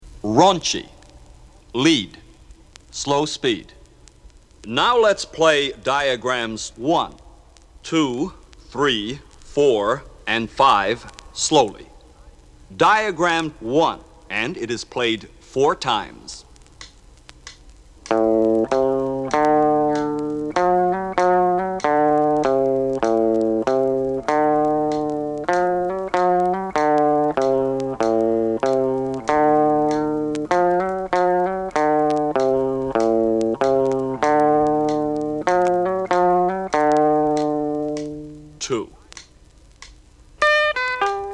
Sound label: lead, guitar